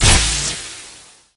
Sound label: Inferno Hit; Halleys